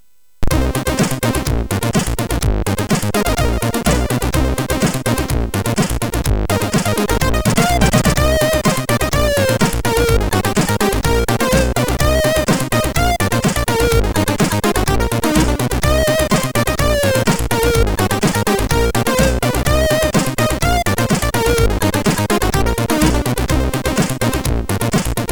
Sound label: Disco Remix sid MOS6581R2; soasc